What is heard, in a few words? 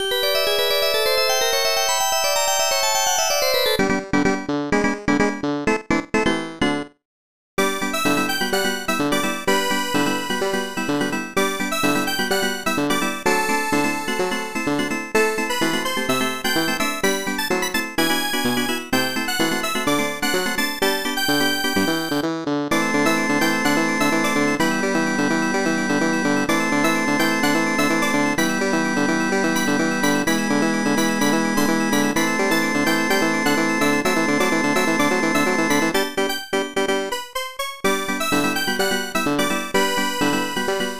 Round Start + Game BGM